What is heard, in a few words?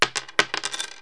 coin fall, cartoon crashes